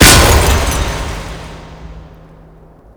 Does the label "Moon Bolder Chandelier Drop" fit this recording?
Yes